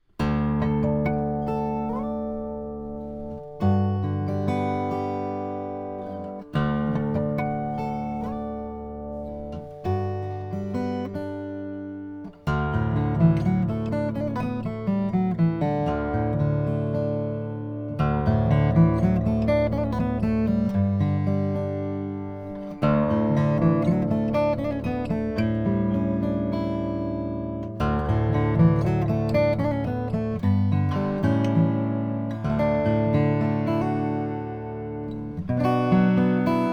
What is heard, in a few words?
2011 langejans fm 6 figured mahogany